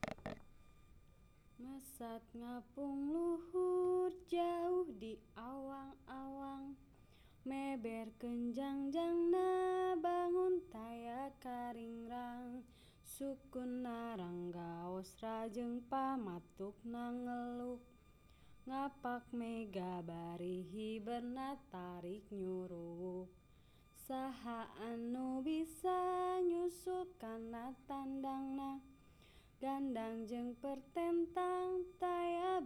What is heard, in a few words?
Song of Manuk Dadali, sundanese; Language Documentation Training Center Project